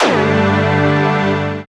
synth bend, dance synth; Sound & Vision - Gigapack I CD 2 (Roland)

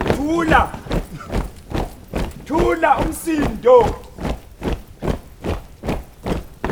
HUM RUNIN, Foot & Mouth; Roland LCDP14 Africa VOL-2

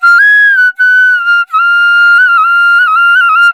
INDIA PICC; Spectrasonics; Heart of Asia; IND PICC PH

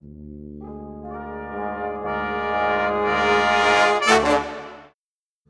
Brass, Orchestral, Rock Pop; Sonic Foundry (Sony Creative Software); Orchestral Series VOL-4 - Rock and Pop

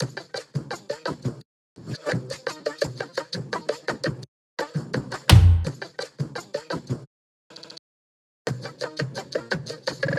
loop perc 85; Seismic Loops

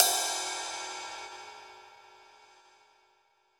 Pop Ride, Cymbals; Roland BK-5

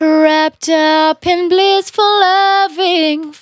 Finally 70bpm C, Velvet Vocal; Zero-G

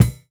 Kick, jungle breaks drum sounds

